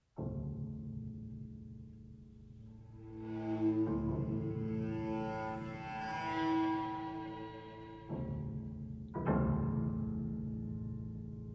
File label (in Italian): per violino violoncello e pianoforte